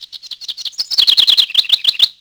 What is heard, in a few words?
Bird; Project Gotham Racing 2